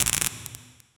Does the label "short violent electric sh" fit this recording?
Yes